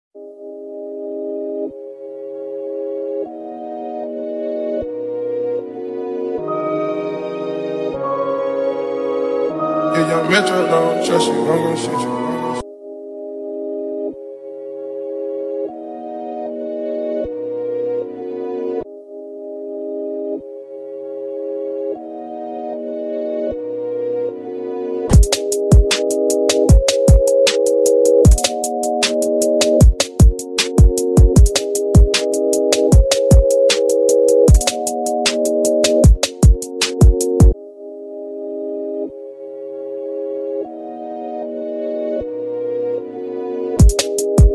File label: Instrumental